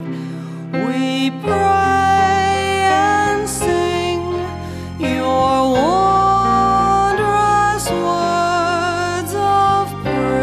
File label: piano